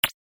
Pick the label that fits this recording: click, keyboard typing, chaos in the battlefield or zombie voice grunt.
click